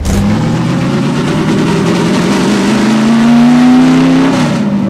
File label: jnk first, vehicles, junker; Portal 2; Source Engine; video games